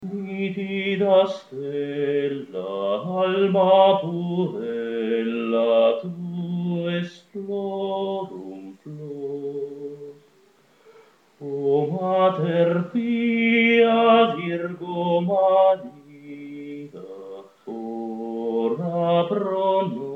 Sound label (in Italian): Bassi; Nitida Stella